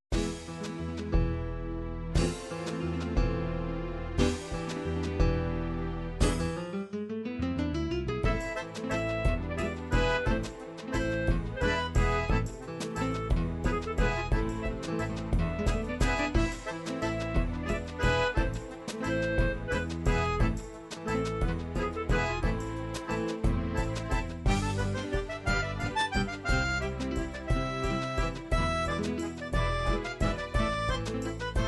flamenco, midi

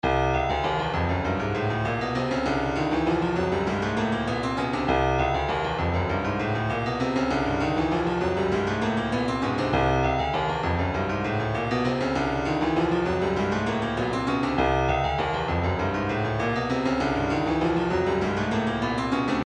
retro funk 099, midi; seizure salad

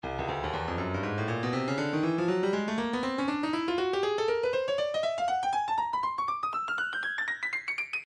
stylus breakbeat midi files 120 bpm; Ghetto Buster B

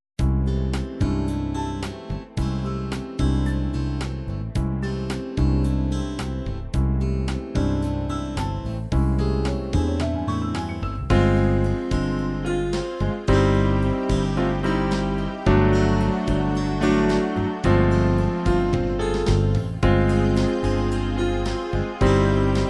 ballads ballad, midi

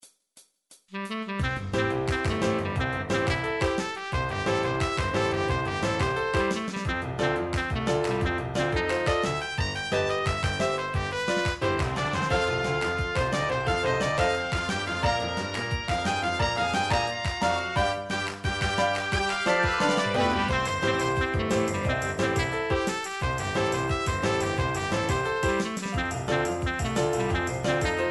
rock, midi

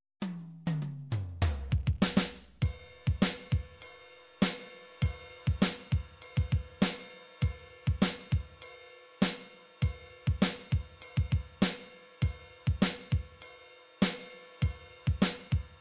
midi drums

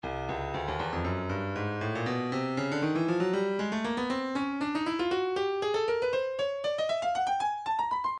breakbeat midi 118 bpm; Vroomba A; Stylus Breakbeat Midi Files

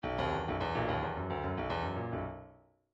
bdf midi file grooves funk k funk k

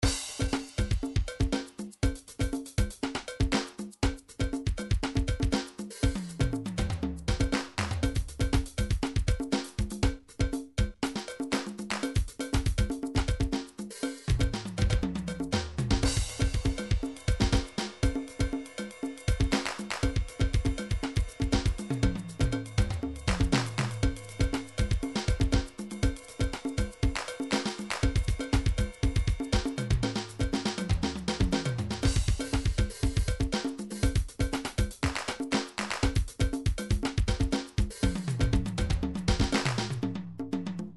jungle; sonar

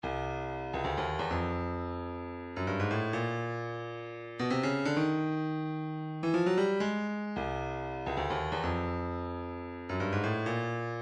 131 wavedrum, midi

